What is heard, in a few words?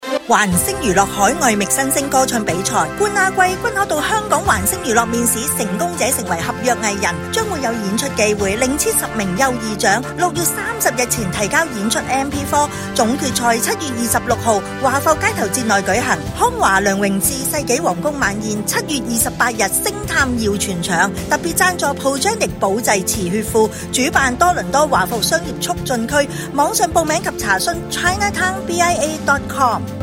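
Singing contest mix; TCBIA 2026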